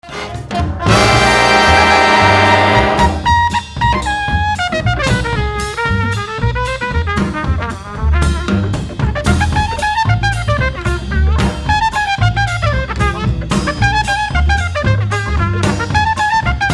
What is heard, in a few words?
blues